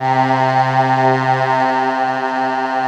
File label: choir C4; MELLCHOIR; Synth